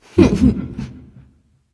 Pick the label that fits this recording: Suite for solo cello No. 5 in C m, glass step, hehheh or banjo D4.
hehheh